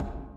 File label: plating, Footsteps